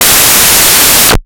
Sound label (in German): 433620kHz AF ein Knopf AM Audio; SDRSharp